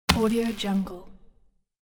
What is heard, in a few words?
sticky muddy slap hit